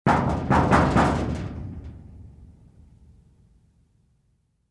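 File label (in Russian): в железные ворота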